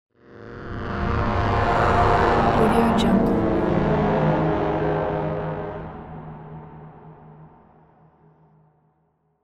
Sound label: sci fi passing by